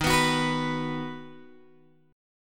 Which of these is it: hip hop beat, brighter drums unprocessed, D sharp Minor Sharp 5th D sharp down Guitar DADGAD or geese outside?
D sharp Minor Sharp 5th D sharp down Guitar DADGAD